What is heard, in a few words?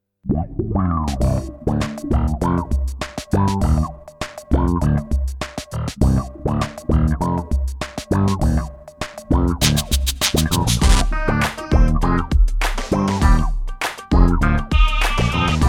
Mirror Instrumental